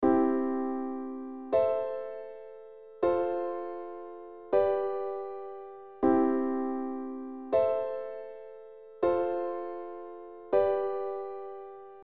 I VIm IV V; Les bases harmonie; Progression Accords

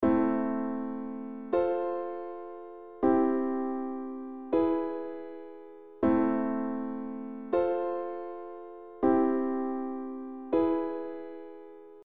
Im VI III V; Les bases harmonie; Progression Accords

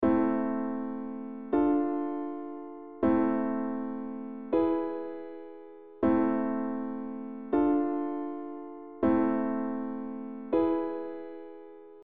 Im IVm Im V; Les bases harmonie; Progression Accords

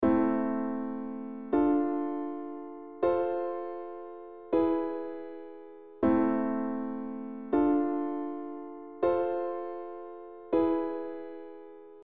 Im IVm VI V; Les bases harmonie; Progression Accords

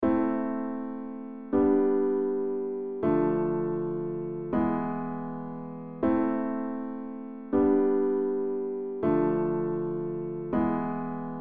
Im VII IVm V, Progression Accords; Les bases harmonie

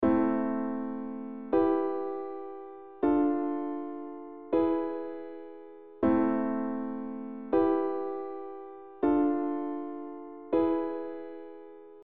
Im Vm VI V; Les bases harmonie; Progression Accords

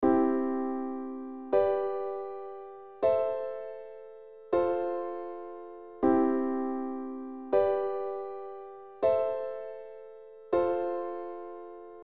I V VI IV, Progression Accords

